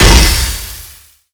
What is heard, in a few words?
Hit Hydrant